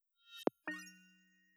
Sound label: alert, notifications